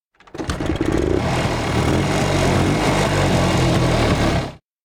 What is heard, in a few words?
bike engine; anemoi